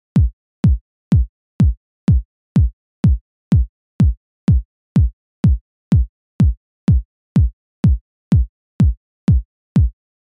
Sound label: EDM Kick Original